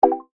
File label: play pause; phet io wrapper sonification